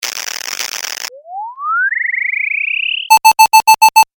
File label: Cartoon Metal Detector; sound design